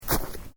Footsteps Boots Snow Step; Footstep Foley; Human

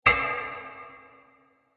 Diffuse Wood Impact With Long Reverb; Miscellaneous Impact; Crafted Signals

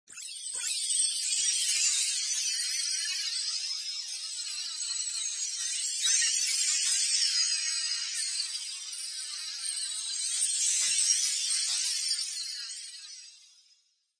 Synth Effect Ambience 98

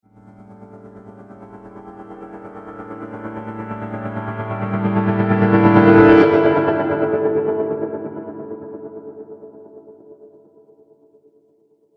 Horror Piano Tremble, Piano Sound Effects

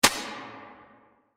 Strike Impact In Cave; Miscellaneous Impact Sound Effects; horror sound effect; Andrew Fleming

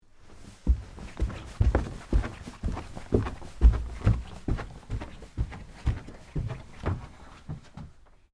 Stairs Up Carpet, Footsteps, Stairs, Up, Carpet; BlastWave

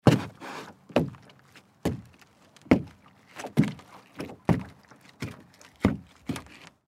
Canoe Movement Footsteps; Transportation Watercraft; Footstep Sound Effects; Footsteps (Sound Effects:Human); Blastwave

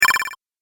Game Power Button, video game

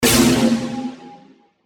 Hit Crystal, Hit Crystal Ring Phaser; Production Element Imaging Element Accent Transition; Blastwave

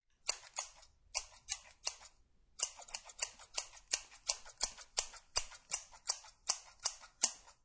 Quick Scissor Hair Cut; Classroom; Quick Scissor Cut of Hair; Schools

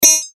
Beep Tone Signal Multimedia

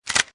Machine Gun Foley Insert Magazine; Machine gun, type 2; Gun Handling; Lynne Music

